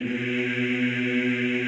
EEH, Classical Choir; Best Service; Extended Classical Choir

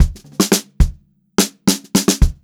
109 groovy fill 3, funk groove drumloops; USB Soundscan vol.38; AKAI

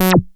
SHORT ACID, BASSES

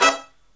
HI CHORD, Brass

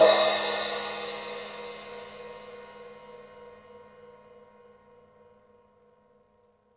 hihat - opened; JazzFunkKit

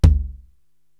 TOM Low, drum machines

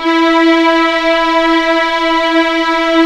E4 EXP MF, VIOLINS; East West; The Ultimate String Collection

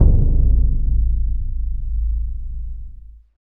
PRC CBD, Orchestral Perc; Roland LCDP03

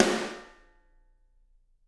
SD, Drums; Bob Clearmountain Drums I

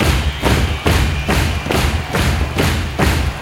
Crowd Effects

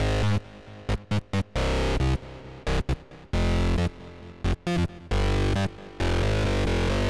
135 Dist Gtr; Zero-G Trance Inducer; 90 s Sample CDs

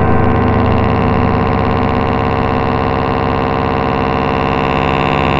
Ground, Industrial

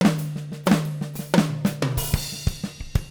124 LATIN, Living Drums; Sampleheads; Peter Erskine

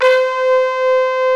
SYMPHONIC BRS

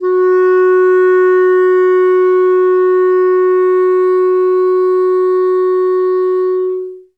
F#3, Clarinet; Best Service ProSamples vol.51; Classic Orchestra 2

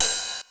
cymbal, Roland MC-909

